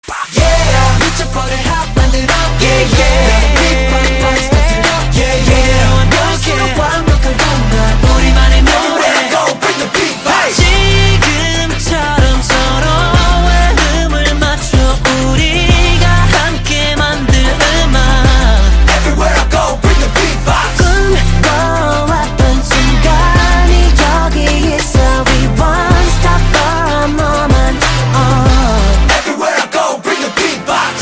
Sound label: Beatbox